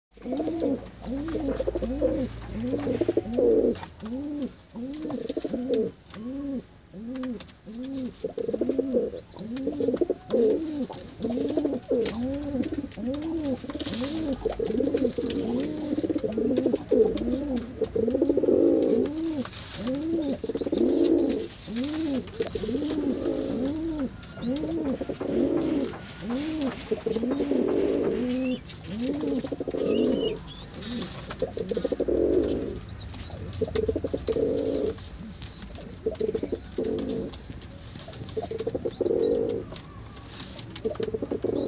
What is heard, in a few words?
sound pigeon